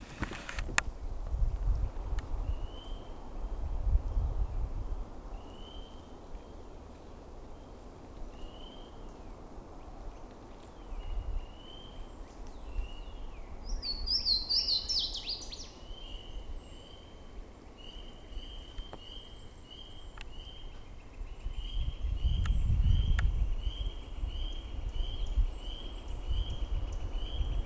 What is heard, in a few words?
louisana waterthrush